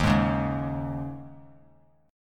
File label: Eb 7th Suspended 4th; Eb7sus4; strummed; 15,15,16,18; JGuitar